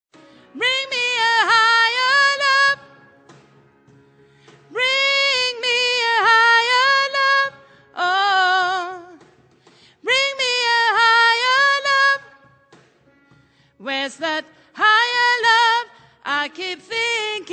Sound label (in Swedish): bas